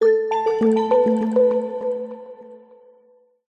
multimedia alert dreamy soft warm drippy delayed bells positive